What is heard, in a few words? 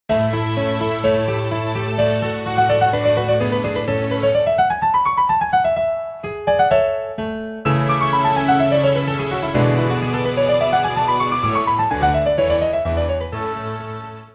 piano concerto n 23